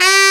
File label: TENOR SAX